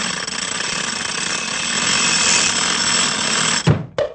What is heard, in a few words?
mechanical clicks with boomp and klunk